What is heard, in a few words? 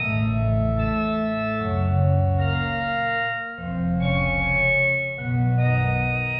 Video Organ 75-A, horrorscape samples 75bpm